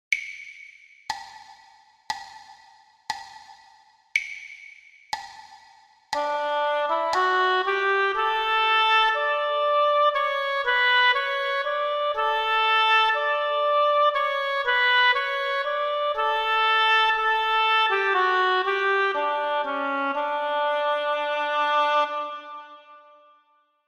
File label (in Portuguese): percepcao ditado